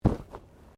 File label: Bodymovement Fall Light; Body movement foley to simulate falls and deaths; Wrestling And Struggling